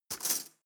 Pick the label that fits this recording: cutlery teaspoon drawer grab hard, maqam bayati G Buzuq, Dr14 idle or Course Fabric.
cutlery teaspoon drawer grab hard